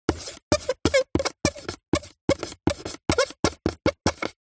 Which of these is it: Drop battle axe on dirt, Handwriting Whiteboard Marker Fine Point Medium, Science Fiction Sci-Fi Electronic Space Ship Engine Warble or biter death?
Handwriting Whiteboard Marker Fine Point Medium